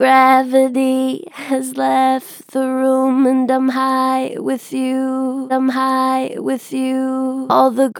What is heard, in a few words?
Str Vib Gravity has left the room, Vocals, Natural Vibrato Strong; Harmor Vocals